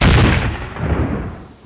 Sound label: Cannon Wall Hit; Defiance